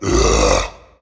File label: knight growl; selflessknight